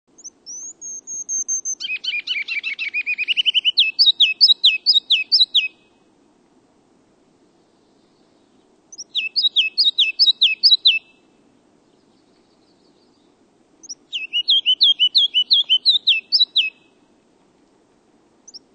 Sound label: Ruby-crowned Kinglet; Birds